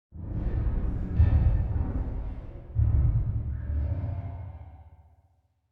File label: vent crawl, alien